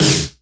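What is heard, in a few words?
FLASH snare drum; Best Service Hyper Dance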